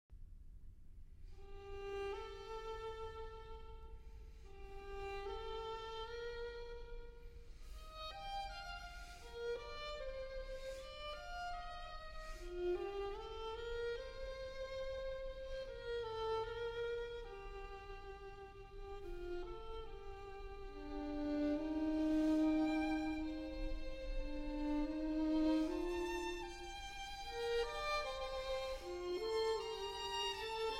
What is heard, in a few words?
Piano Quintet Fugue. Adagio